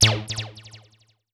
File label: Zap FX, 8-Bit; 8-Bit Kit Volume1